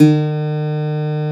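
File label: Steel Acoustic Guitar E3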